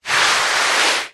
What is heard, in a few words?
foley foot scrape long scuff carpet